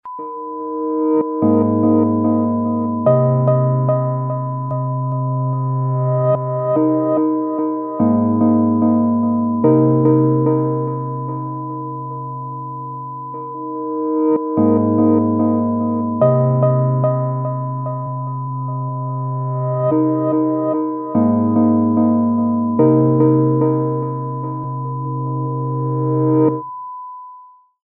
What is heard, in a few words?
air groove